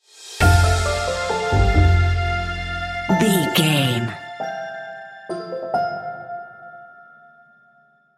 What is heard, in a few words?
sentimental uplifting piano and strings sting